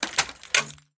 Lock Cage Open; Game rip; MiSide

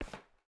Step Wood; MiSide

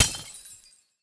Shield Destroy; Game rip; MiSide

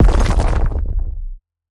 Crystal Up; Game rip; MiSide

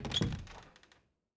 Scarf Open; Game rip; MiSide

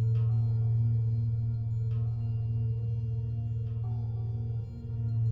Ambient Finish; Game rip; MiSide

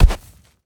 Enemy Fall; MiSide

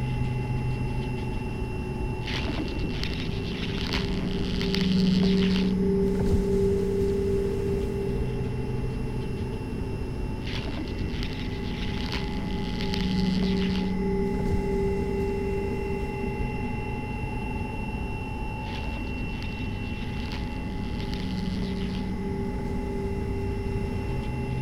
Tonnel; Game rip; MiSide

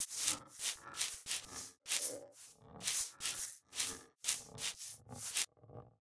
Itch; MiSide

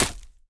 Shot Enemy Head; Game rip; MiSide

